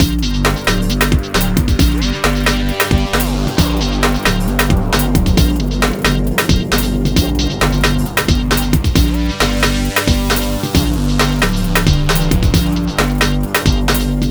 Hardcore Breakbeat, 134 Gmin; Big Fish Audio; Hardcore Breakbeat Action; Rezanator

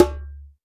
CONG TOM, CONGA BONGO; Best Service ProSamples vol.54; AKAI

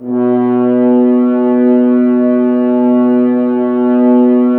Brass F.Hrns; Sample CDs; Miroslav Vitous; Woodwind Brass Ensemble

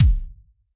hip hop kit kick

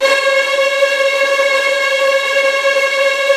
STR TREMOLO, Strings

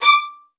XST1 D6, LOUD VIOLINS; Q Up Arts; Denny Jaeger Master Violin Library

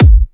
Trance Kicks, Bassdrums

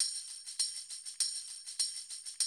drum loop, 100 BPM

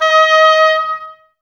OBOES D#, Woodwind; Wood Winds; Oboes Links; Miroslav Vitous; Woodwind Brass Ensemble

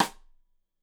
Side Stick Stereo; Deeply Sampled Drums; SMDrum

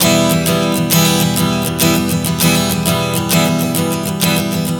Strum 100 Em, One Chord Strumming 100 bpm; Acoustic Guitar

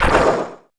SPLATE, PERC; NorthStar - The Wizard VOL-2; TSAR PERC

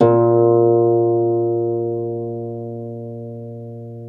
Troubador Harp

